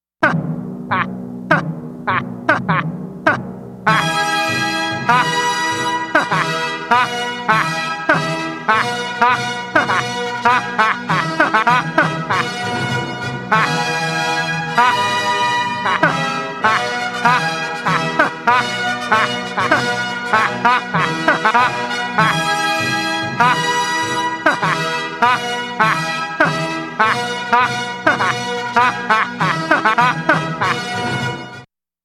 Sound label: Laugh